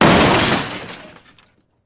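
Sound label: sudden ringing crash of dishes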